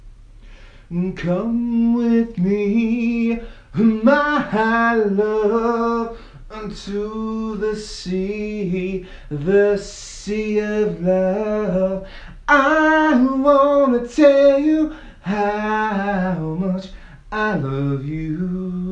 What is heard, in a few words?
A Cappella Karaoke; Weekend A Cappella Karaoke